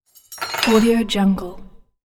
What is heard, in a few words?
silverware on plate; chicasound